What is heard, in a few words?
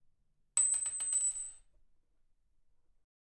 Ring Hitting Granite Top